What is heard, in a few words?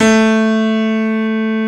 GRAND A2, PIANO; GRANDMF